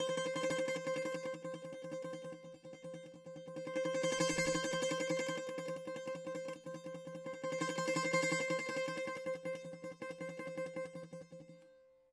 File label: bouzouki